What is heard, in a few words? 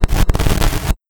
Glitchy